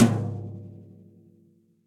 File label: Tom Low Session Dry; Drums; Drum Hits; Ableton; Session Drums Studio; MPE